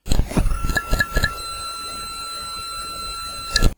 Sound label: microdrive